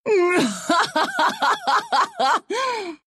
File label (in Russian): Pain laugh, ru